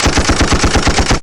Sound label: machine gun; Crash Bang Boom